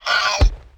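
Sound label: war die, Mob; DEMOLIS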